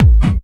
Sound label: KICK hardcore, Techno Trance DRUMS